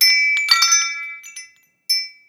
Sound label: Bell Chimes